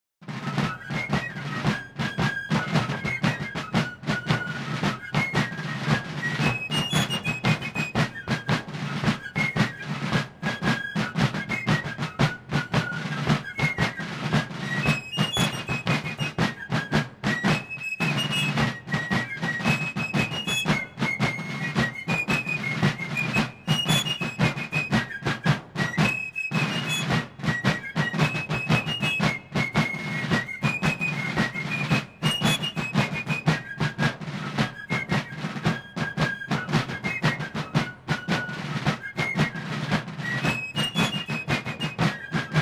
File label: Drum & Bugle; Campbells Are Coming